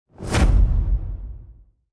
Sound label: ui match result win